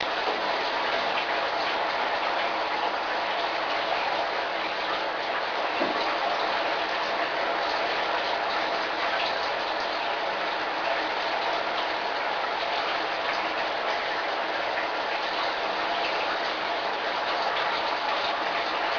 shower

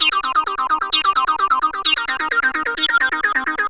Psychedelic Trance Synth

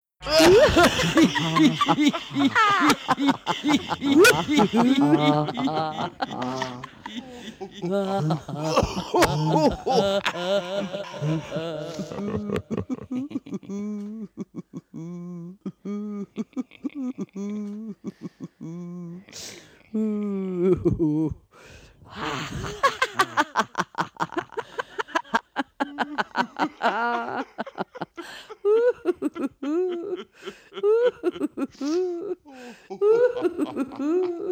weird laugh

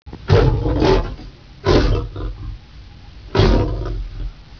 lions

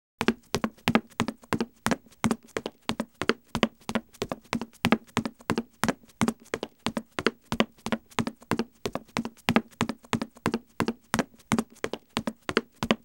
steps wood jog; Asian Show Sound Effects

